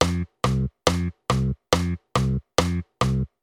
Basove figury polka 8minove doby

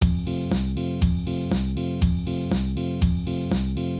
Polka zakladna figura

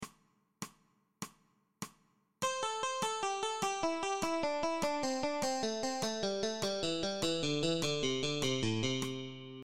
osminove trioly nadol alternativne brnkanie; cvicenie

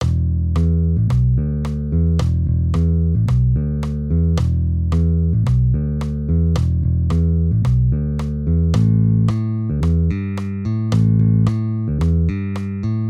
Basove figury bigbeat

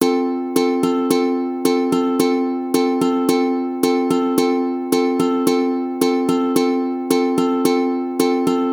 Rytmy ukulele spomalene

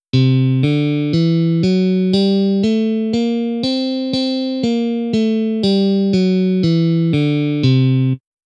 stupnice elektricka gitara podhalanska

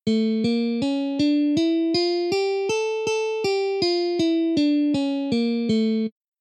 A ciganska dur nahor nadol

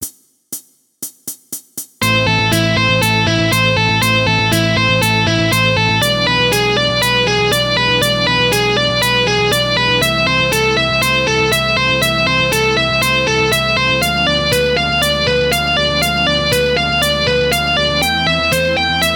cvicenie osminove sled akordov

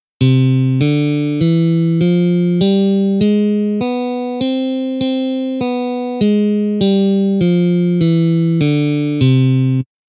Harmonicka dur od C nahor aj nadol

